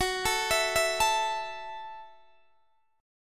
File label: Gb Minor 7th Gb, Guitar Open D